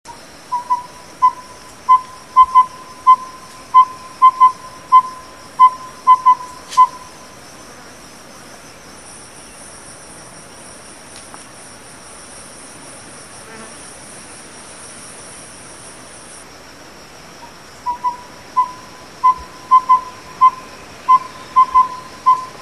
glaucidium brodiei

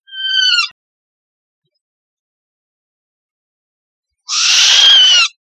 strix rufipes

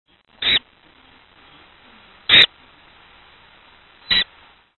aegolius funereus

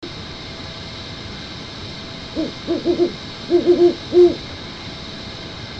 bubo virginianus

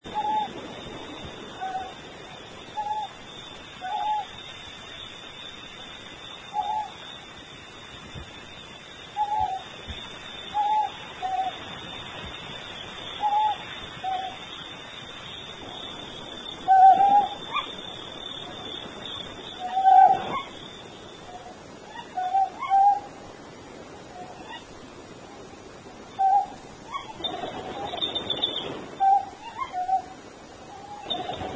otus elegans

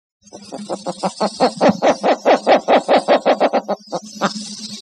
strix rufipes

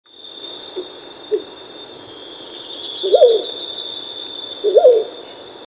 strix virgata

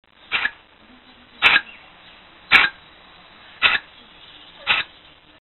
otus scops

